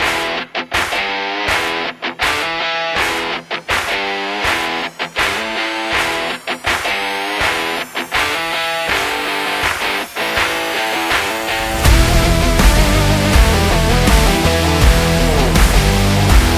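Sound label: Energy Drink, sport and action music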